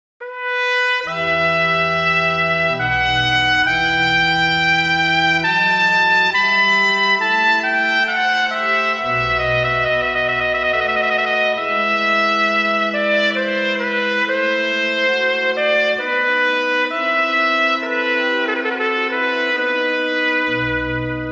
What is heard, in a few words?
Preludio Sonata in E minor, Horn and Organ; Arcangelo Corelli